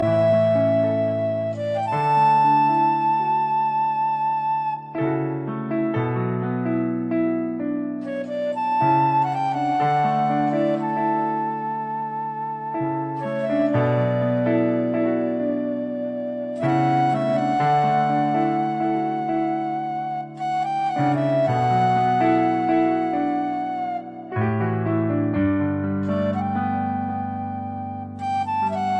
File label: Native Indiann Flute Indian Ringtone